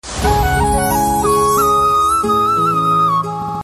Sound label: best telugu flute tune